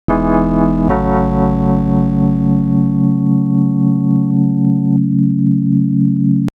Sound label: chords